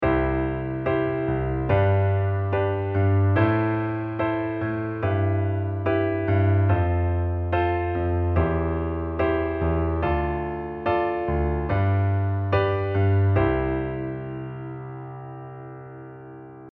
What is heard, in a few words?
LH root